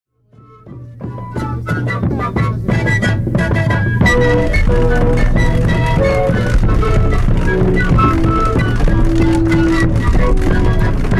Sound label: singing